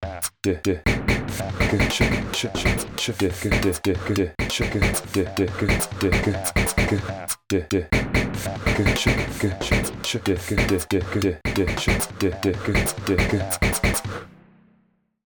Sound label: Sensory Percussion; Sunhouse